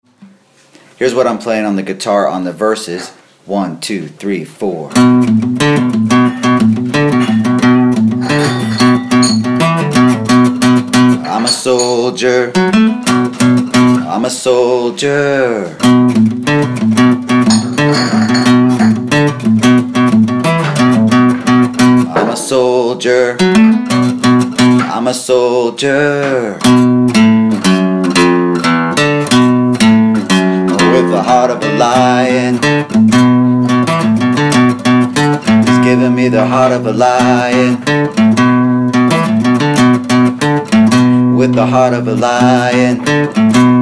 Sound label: guitar